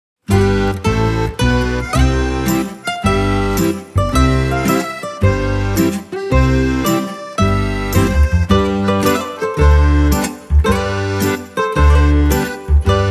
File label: italian happy music